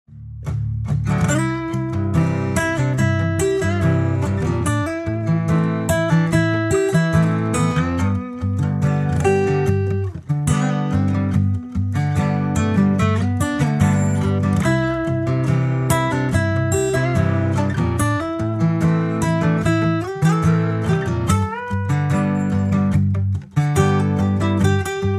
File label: Bossa Trio